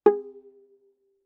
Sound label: Viola pizz ff sul G Ab4; MIS Pitches - 2014; Strings